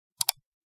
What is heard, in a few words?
mouse click; sliyanstudios